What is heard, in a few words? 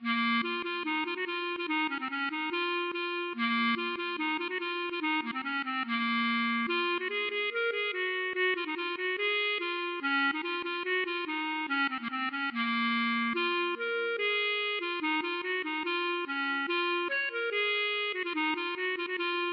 klezmer